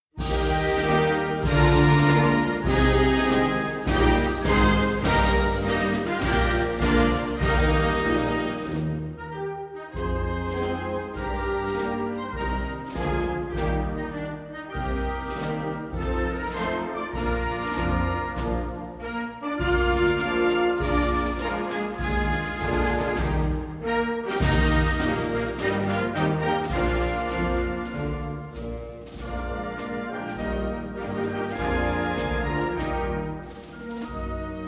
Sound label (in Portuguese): Hino Nacional da Republica Portuguesa; Portuguese Republic